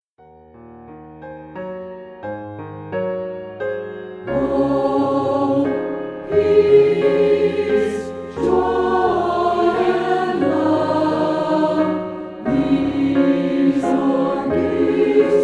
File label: cong choir; Celebrating Grace